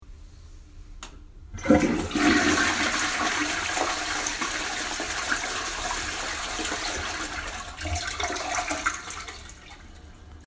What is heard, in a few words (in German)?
Geräusch